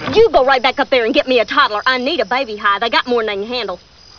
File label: toddler; raising arizona